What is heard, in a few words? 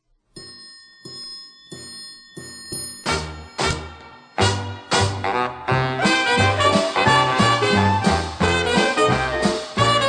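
Music To Lead Cavalry Charges By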